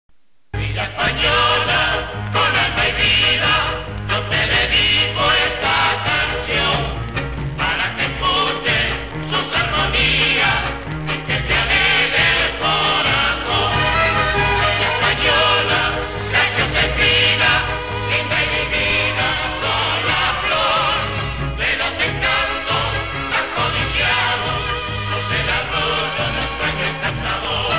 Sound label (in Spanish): himno paso doble; villa española